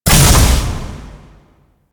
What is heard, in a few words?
taller door open; ambience; Warframe; Entrati